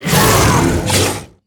Devilsaur Attack; Loti

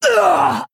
Female Orc Death

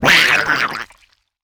Spawn Of N Zoth Attack

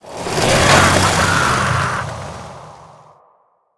Camouflaged Dirigible Attack

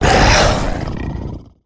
Attack

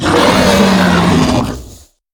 Chimaera Play

